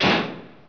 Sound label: hood